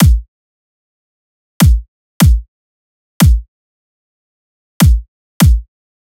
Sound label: Dubstep Drum Loop Kick, 150bpm; Stem Seperated